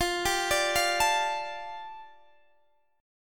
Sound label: A 7th Suspended 4th Sharp 5th, F